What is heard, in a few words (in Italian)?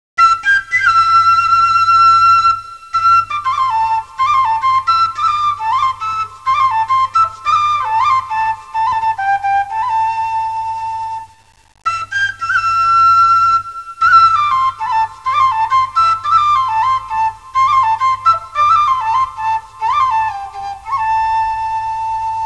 musica strumentale